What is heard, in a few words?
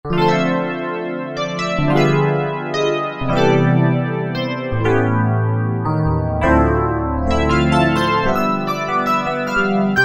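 Pf Split, piano; Yamaha EX5R; Factory presets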